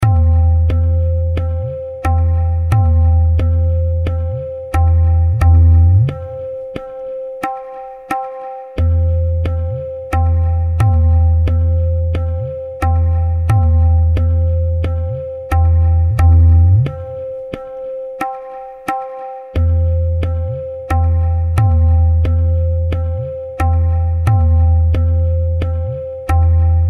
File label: tintal, tala